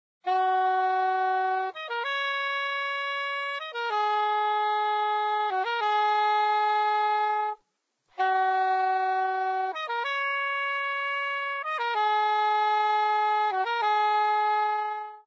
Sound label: Saxophone; Zomboid; Lifestyle